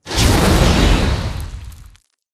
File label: atlas dash close; Titanfall 2